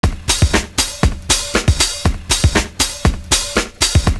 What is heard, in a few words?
fat disco; Univers Sons